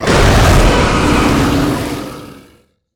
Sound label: medusa death